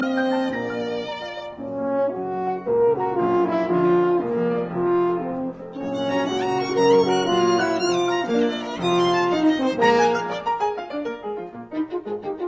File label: Trio for Violin Horn and Piano in E flat major Scherzo Allegro; Brahms; Op 40